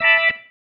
Stab One Shots, Organ; Loops; Stabs; Sonic Foundry (Sony Creative Software); Joe Vitale Organ Donor

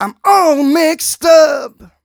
m 127 mel a, Vocals

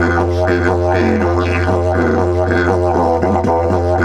120, Didgeridoo

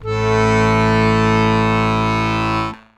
BASS A 1; Best Service; World Colours

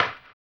HAMBONE; ILIO; Vocal Planet VOL-2; Blues & Soul

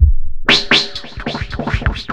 FILTER, Big Beat Drumloops

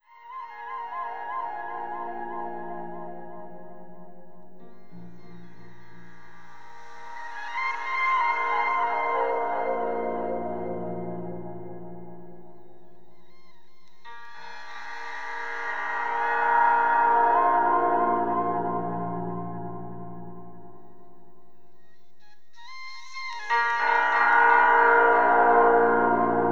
Piano Wave; Sonic Foundry (Sony Creative Software); Slow Silhouette

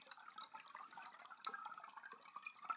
Waterway C Light

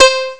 Acc Git; ST-XX Amiga Soundtracker Sample Packs

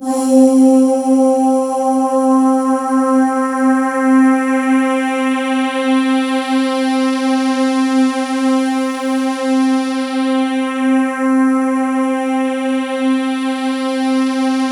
C3, Synth Leads

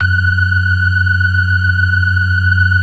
54 BLOOD L 2, ORGAN; Keith Emerson Signature Series

